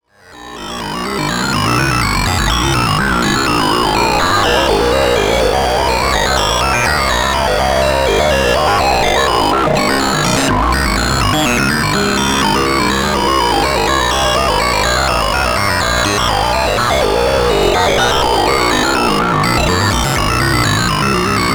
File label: Xaoc Devices Leibniz Binary Subsystem Shape Mod Thru Zero Phase Modulation